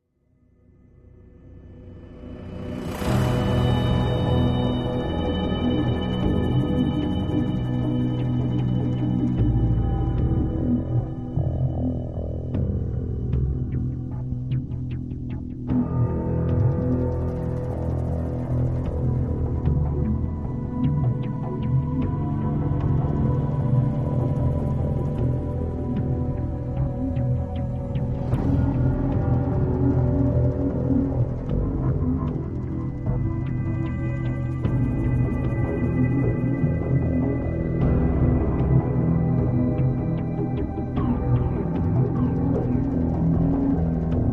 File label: Loop Suspense; tales from the borderlands gamerip 2015